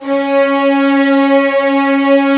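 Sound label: Violin Legato C#3; Multisamples; Digital Sound Factory Virtuoso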